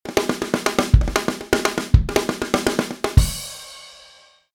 Snare Roll

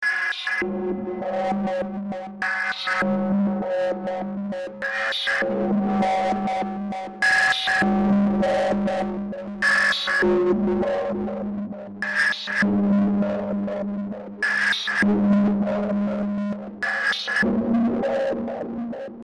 100bpm Rhytmic noise; un esperimento "ritmico"

